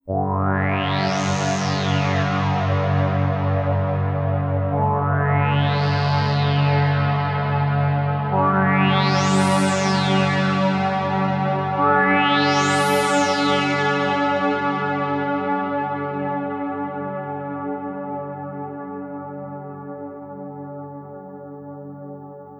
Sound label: Ambient